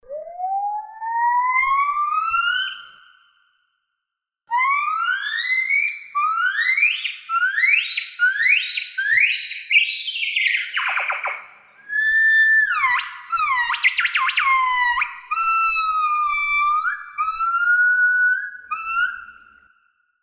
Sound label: Lar gibbons